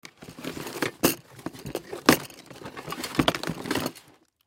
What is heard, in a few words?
Toolbox Rummage Through Tool Plastic; Hand Tools; INDUSTRY; Blastwave